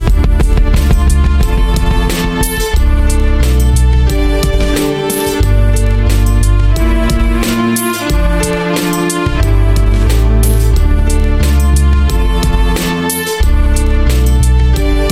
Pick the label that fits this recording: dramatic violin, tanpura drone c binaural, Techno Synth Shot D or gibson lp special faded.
dramatic violin